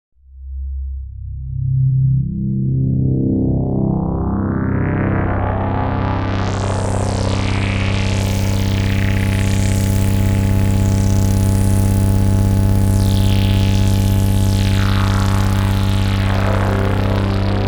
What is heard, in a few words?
basses